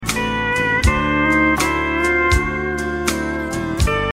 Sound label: chop, e9th